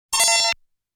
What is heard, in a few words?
Continue Activation Sound; Zero Wing